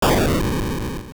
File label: Thunder; Thunder at sea